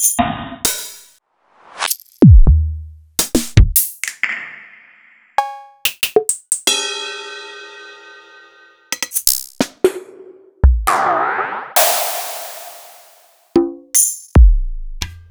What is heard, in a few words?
EMU Orbit